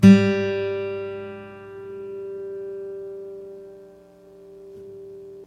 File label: G String